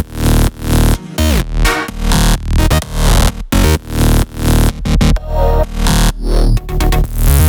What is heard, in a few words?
128BPM Melody Root D#; Vengeance Samples; Dynamite Kit